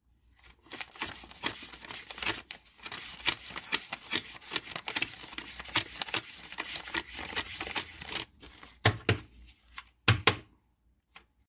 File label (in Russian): bystroe pereschityvanie dollarov; Звук купюр, долларов